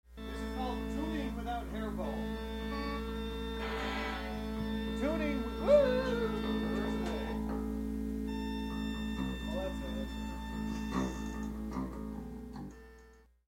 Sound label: Tuning